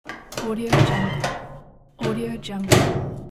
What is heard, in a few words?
opening and closing metal door